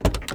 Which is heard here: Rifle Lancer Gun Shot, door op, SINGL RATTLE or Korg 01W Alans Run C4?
door op